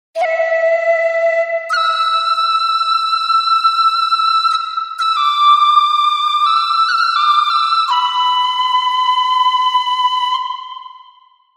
Shakuhachi; ringtones; Zen Tones

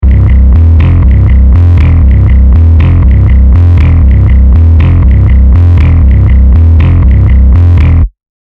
How Low; ringtones

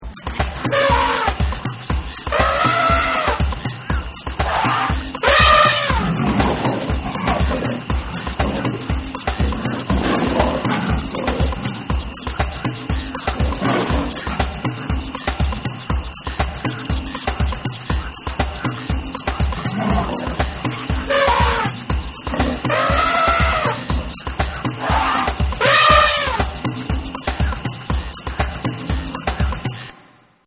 Watering Hole, African Safari; ringtones

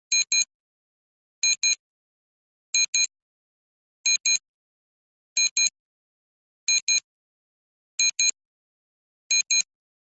Digital Watch; ringtones; Wake Up

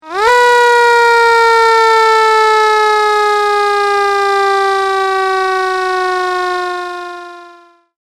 Siren Call, ringtones

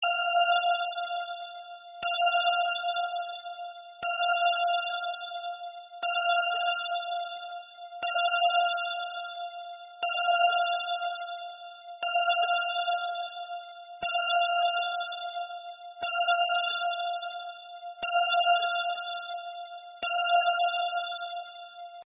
Urgent Bells; ringtones; Classic Rings And More

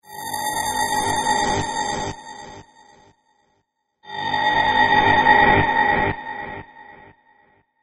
Tesla Coil; ringtones; iPhone3000

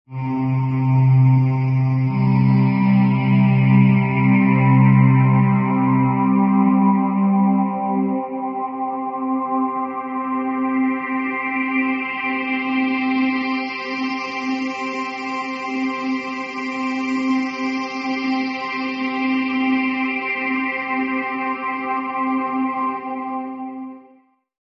Moonrise Alarm; ringtones; Wake Up

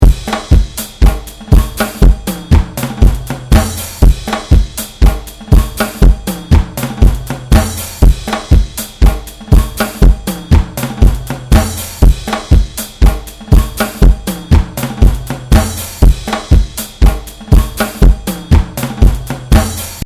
Hum Drum, ringtones

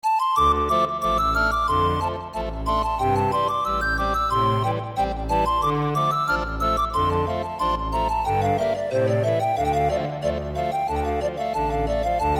Pirate Music; ringtones; MacMost Ringtones

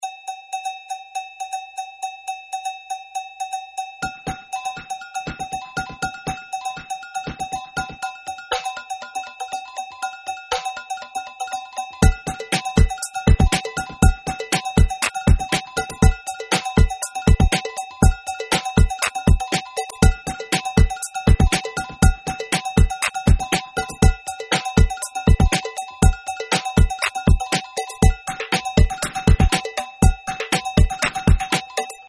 Mo Cowbell; ringtones; Designer Series

